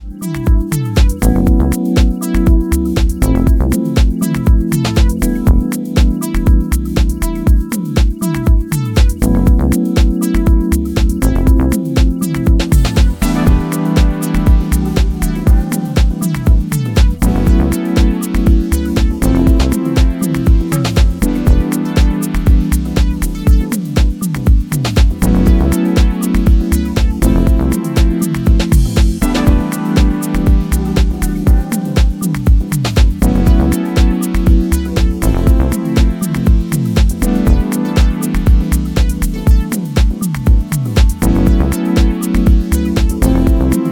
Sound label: instrumental remix